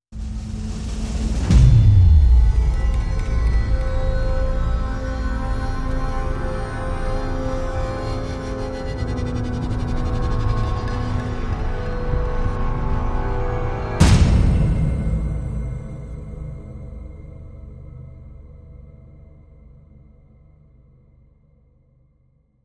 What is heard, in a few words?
Hold Ur Breath Sting